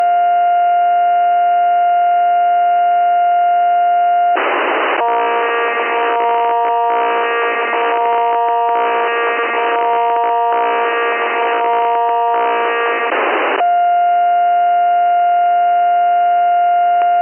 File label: fm tone dualtone